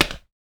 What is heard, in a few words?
Plastic Slap, household rhythm samples hits